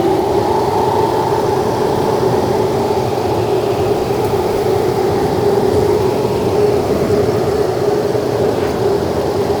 Wind outside

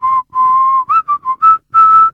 whistle; Mortal

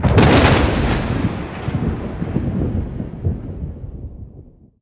thunder

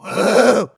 static scare

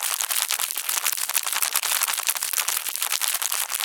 clatter claws

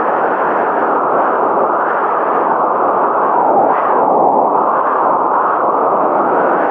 Wind inside

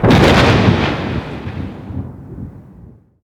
thunder; Haunter

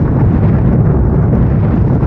tremor outside

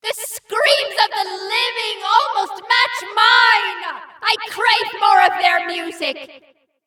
moonscream

